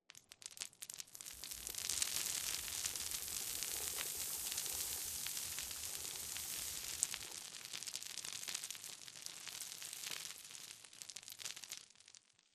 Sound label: Rock Debris Small Long; Boomtown; Titanfall 2